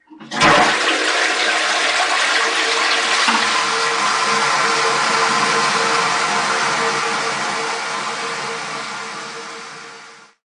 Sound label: ringtone wc flush